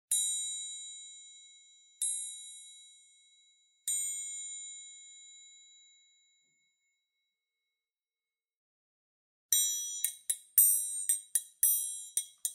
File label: Triangle; NINO